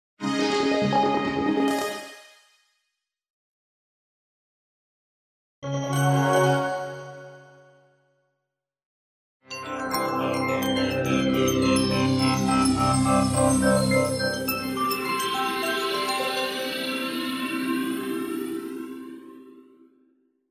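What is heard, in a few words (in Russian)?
ритм реверберации конец короткой музыки логотип звуковой эффект три группы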